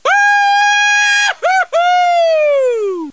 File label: woohoo; deadpool